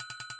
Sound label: count Up Loop; willyshotchillies